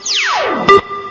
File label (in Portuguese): toque 5 segundos unico